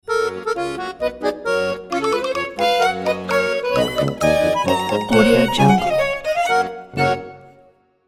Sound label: accordion and violin goofy polka ident